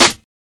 00s clicky acoustic snare f key, steel snare drum sample tuned to the F note; snare drum samples